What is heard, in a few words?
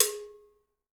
cowbell, gretsch; Dirt-Samples